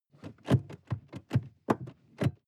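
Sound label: sports car interior seat handling long; verkehr transport; autos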